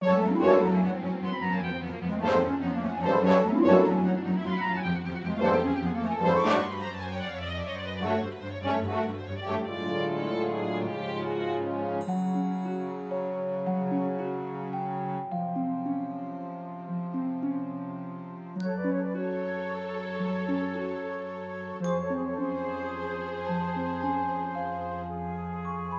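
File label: Magic and Fantasy Scene without Dialogue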